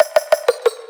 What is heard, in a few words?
percussion oneshot shift; Pop; Drums; SD PASADENA Pop Sample Pack; Slate